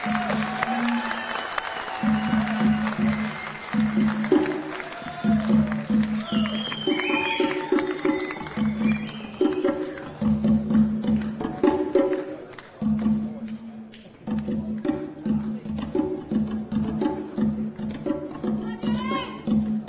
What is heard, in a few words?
Percussion Solo; Sheperds Bush Empire